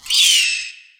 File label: beastmaster bird attack